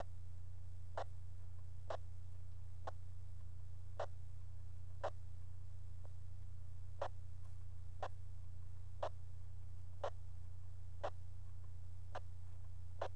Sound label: Squelch Break, Radios; PM3 RTK Radios